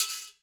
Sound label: Acoustic Percussion One Shot